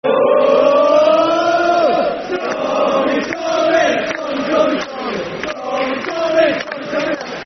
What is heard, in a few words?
Football Chant (Soccer Songs), Oldham; Tommy Wright; Oldham Athletic Oafc